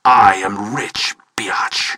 cheers; tf

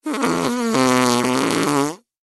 fart; hl2dm

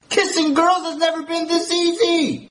kissing girls; chatsound

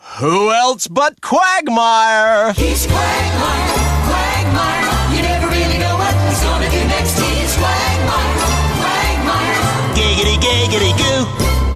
giggity song; tf; saysounds

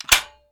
charged, gauss; hl2dm

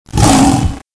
hurt; Predator Mod

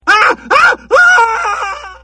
ahh; hl2dm

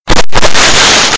fireworks spark; tf

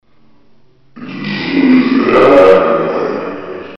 zombie; dod

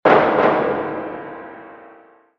death; tf; slender; witch

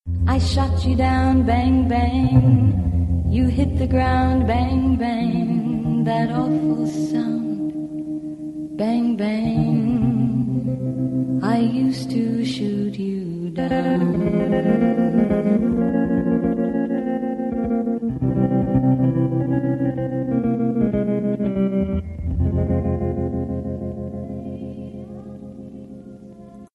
bang

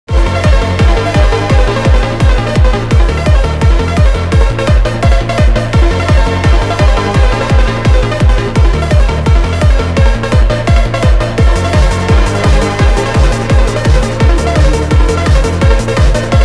music; tf; slender

